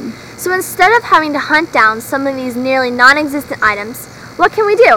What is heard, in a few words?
air conditioner background noise